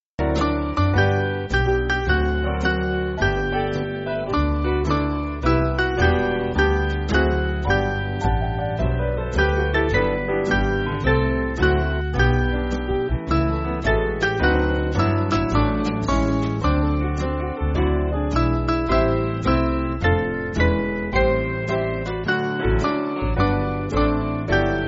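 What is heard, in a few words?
Band, Music Backing; G-Ab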